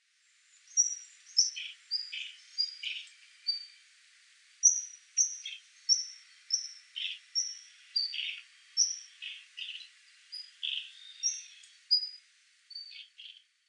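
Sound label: ezomushikui, Sakhalin Leaf Warbler; fukuoka; koe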